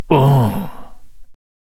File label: Deadeye Attack Vocals